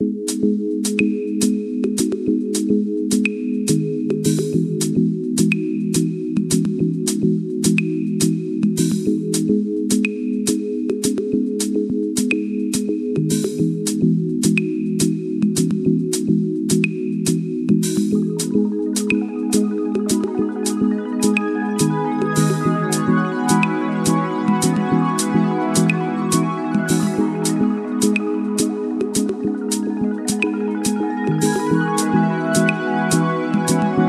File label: Chillout Vibes